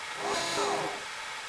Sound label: draw; weapons; blocksmg2; cstrike